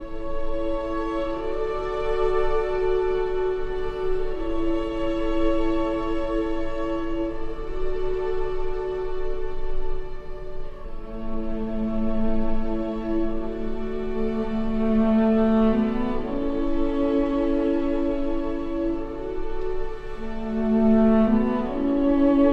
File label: for cello and strings; Archi di Santa Cecilia